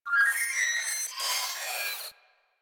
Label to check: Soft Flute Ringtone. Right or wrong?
Wrong